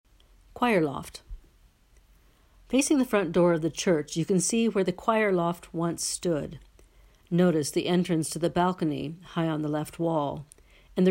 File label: Choir loft